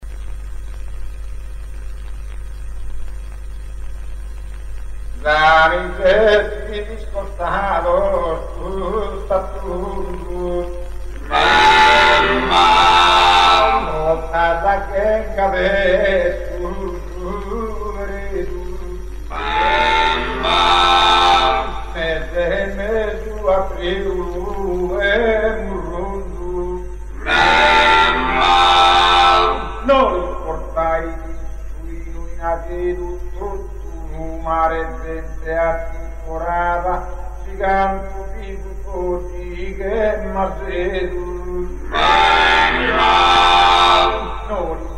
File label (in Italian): terzine improvvisate, canti polivocali; scano montiferro nu